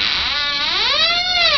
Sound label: DOOR CREAK